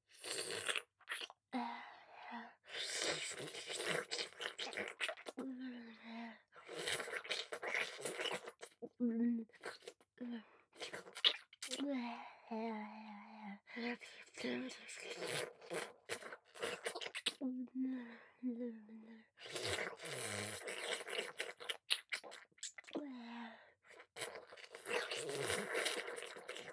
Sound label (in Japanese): 乳首舐め（フェラ）; 乳首舐めループ